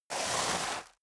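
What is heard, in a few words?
step boots gravel medium slide long, footsteps; schritte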